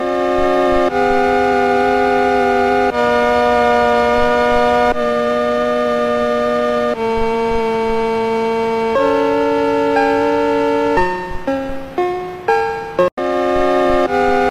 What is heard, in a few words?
peaceful retro